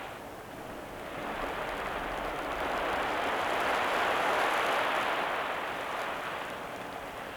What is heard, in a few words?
Blowing Sand